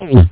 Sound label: gulp; Duke Nukem 3D Kill-A-Ton Collection